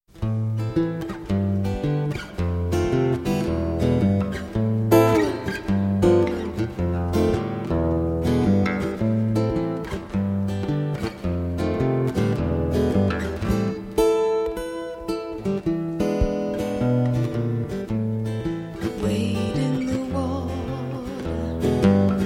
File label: acoustic